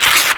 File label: French - present, rip; Birthday Party Bash; Wii